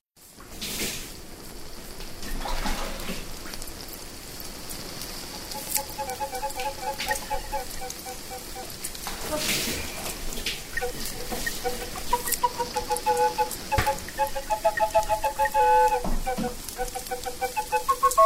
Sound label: fibonacci flute; dradio